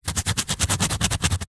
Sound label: foley foam dense scratch sharp object